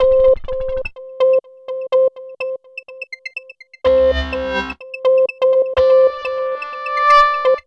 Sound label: Synth